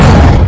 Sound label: fall; devastator